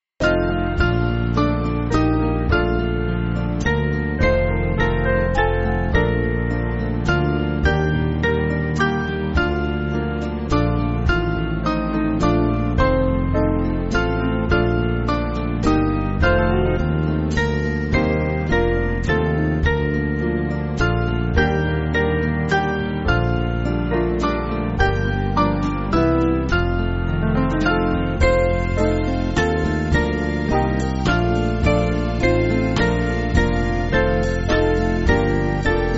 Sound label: Band, church music